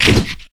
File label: decayed basic attack; LoL; yorick